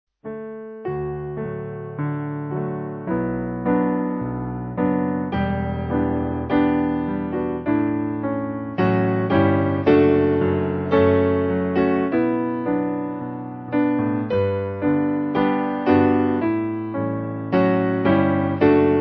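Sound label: Piano, backing; A New Commandment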